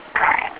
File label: burp